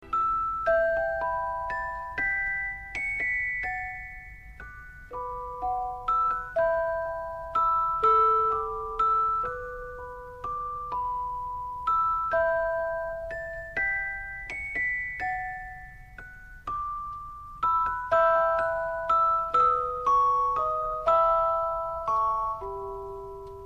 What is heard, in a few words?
Beautiful Classic